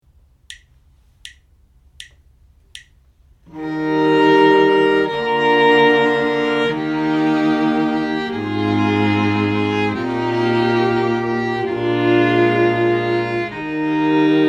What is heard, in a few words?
harmonic dictation